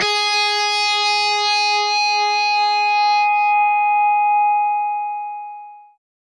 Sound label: g sharp, guitar